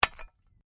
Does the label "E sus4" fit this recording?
No